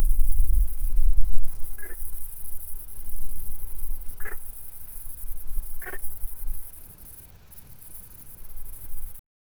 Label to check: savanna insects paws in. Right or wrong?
Right